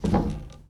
Foosball Forward Spin

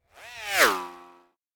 Space Ship By - Space Motorcycle; Hollywood Edge; sound designer's toolkit v2

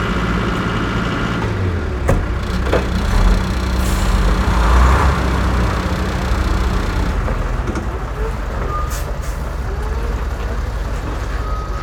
excavator, street traffic; best service Blue Box

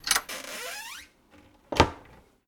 pub toilet door open; DeadFright

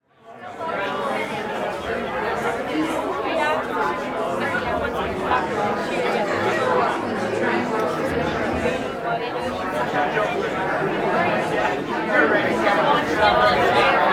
Bar Pub Medium Crowd University Pub, Walla; Sound Ideas; Walla Series

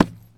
Baseball Hit Wall

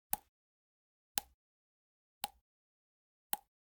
Generic Click Glass Tiny

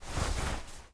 FABRIC Movement Short; mono; Universal Sound FX; CLOTHING

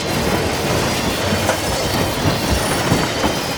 Auto Tires Scrape Wall Loop; Automobiles

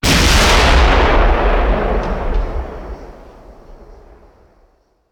Artillery Cannon Artillery; Sound Ideas; Guns

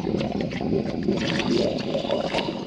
Squish Intestine Bubble Loop; SFX Kit; Foley - Misc

